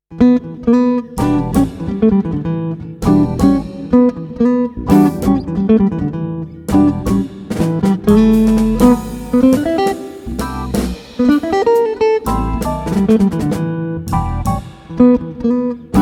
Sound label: Jingles